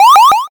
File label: leisure video game retro 8bit power up